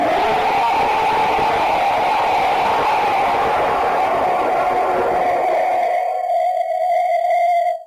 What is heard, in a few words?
scream; laa laa